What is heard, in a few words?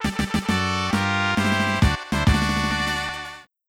victory fanfare mono